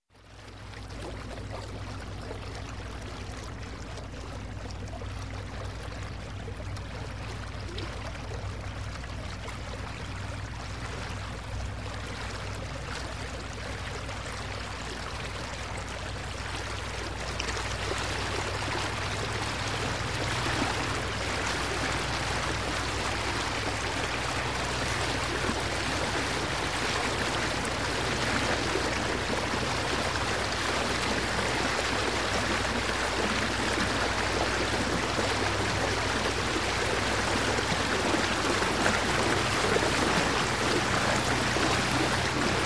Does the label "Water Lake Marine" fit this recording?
Yes